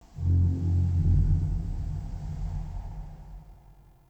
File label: Interior Texture Large Moan, Infested, Ambience; Misc Indoor